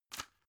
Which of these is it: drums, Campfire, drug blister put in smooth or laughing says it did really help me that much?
drug blister put in smooth